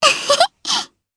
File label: Laugh; Rehartna; kingsraid audio